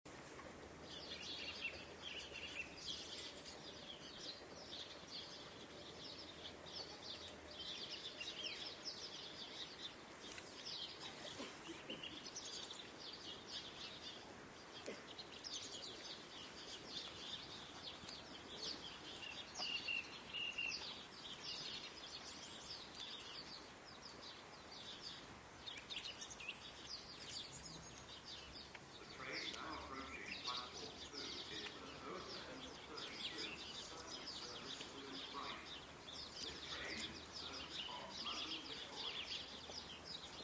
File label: bird song and announcements Hassocks station; uksm